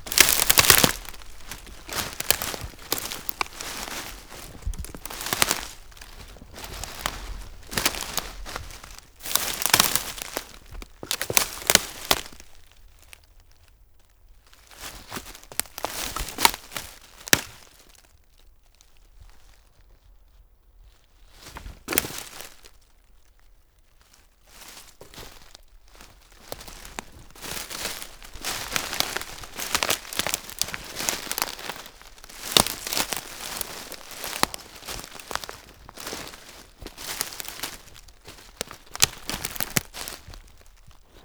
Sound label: Sticks